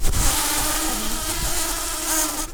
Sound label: bumblebees, Pascuorum queens